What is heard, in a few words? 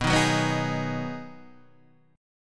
B 6th B, guitar chord